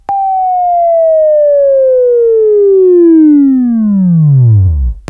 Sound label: WAV Right, Sweeping; Sweeping Training program